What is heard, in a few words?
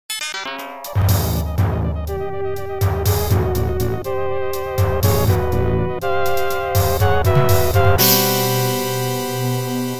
Attack; Arcade; Growl